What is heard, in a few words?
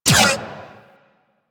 Cart Turret Laser Shot; Warframe; Venus; Venus Turrets